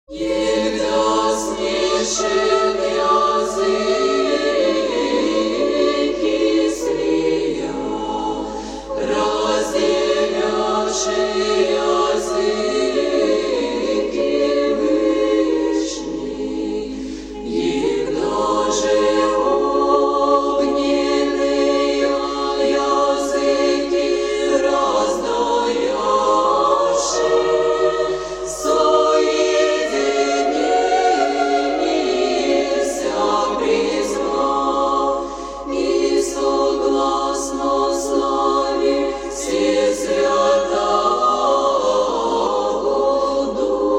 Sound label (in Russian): Hor sester Kondak Svyatoy Troicy; Svyato Troickogo Serafimo Sarovskogo monastyrya